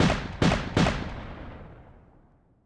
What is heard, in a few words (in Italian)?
artiglieria; BLIND QUEST - The Enchanted Castle